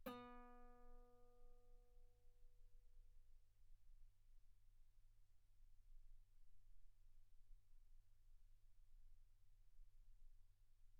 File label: Guitar ff B3; sul B